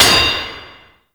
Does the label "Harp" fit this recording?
No